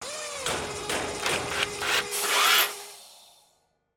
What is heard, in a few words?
silo built; OpenFrontIO